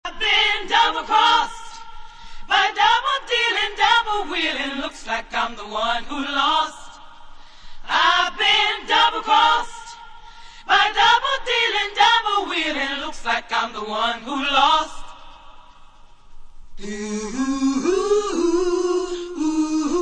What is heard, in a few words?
original background vocals acappella